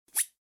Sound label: snick